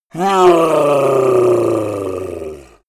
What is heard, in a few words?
yeti growl painful; kreaturen